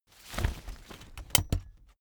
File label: umbrella big open full